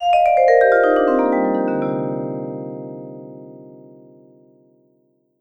VBLCK, VIBE LICKS; Sampleheads - Dave Samuels Marimba & Vibes

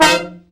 BRA SECT; BRA SECTIONS

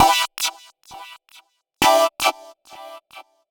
132bpm Synths, uk garage; Lines n Loops

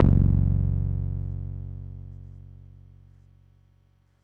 PAFMBass f#1, Yamaha YX81Z

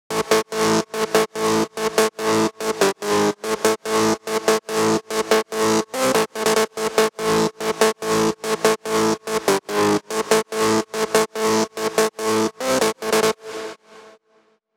144 BPM Verse - Acid WET; Vengeance - Essential Clubsounds Vol.5; Flight Kit Root Amin; Melody & Synth

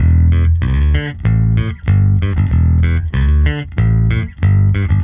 EBass Brdg Pick 95 E1; musicradar; bass boutique; 95bpm

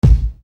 Bass Dr, EuroDance Bdrums

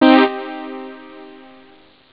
reverb; jazz jackrabbit 1; M8

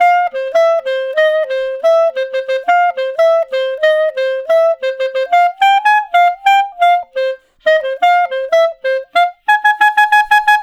Clarinet Solo; Sonic Foundry (Sony Creative Software); Jazz Solos and Sections

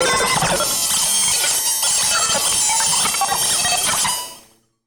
Malfunction Synth, malfunctioning synth samples

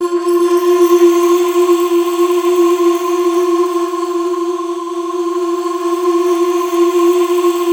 PADS

